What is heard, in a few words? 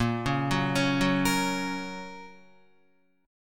A sharp Minor Sharp 5th, down, Guitar; A#m#5; arpeggiated; JGuitar